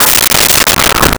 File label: Hockey Puck Goal Post Hit; Sports & Recreation; SONY PICTURES SOUND EFFECTS SERIES